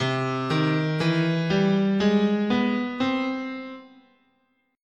C Augmented, scale

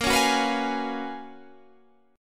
Gb Major 11th

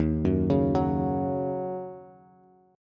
Eb Minor 7th, down, Bass; arpeggiated; chord; JGuitar

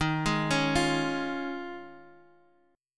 D sharp 7th Suspended 2nd Suspended 4th; D#7sus2sus4 Chord {X X 1 1 2 1} arpeggiated

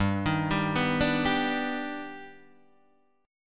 D sharp Augmented Major 7th G, chord

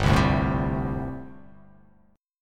G Suspended 2nd Suspended 4th down; Gsus2sus4 strummed; JGuitar

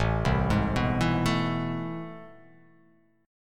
A Minor Major 9th, down

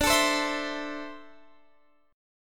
D 7th Suspended 2nd down Guitar Drop D

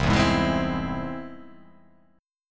C Augmented down

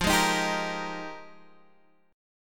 F Minor 11th F down, chord; Fm11; strummed; JGuitar

